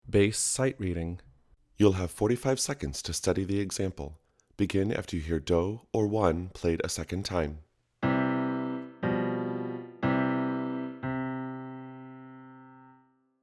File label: Extended SR Bass